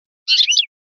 quejas picolio; campero

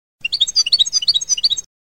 floreo tibali tibali, campero

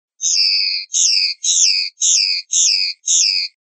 rep golpes

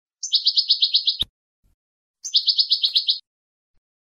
padreos corridos; campero

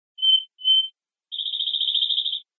primaveras, verderon

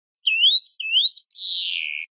rascada, verderon

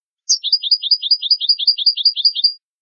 boli

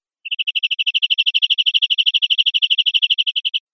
campaneado, verderon

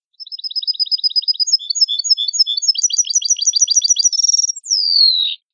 discordantes

